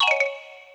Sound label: Shrink; Dunkanoid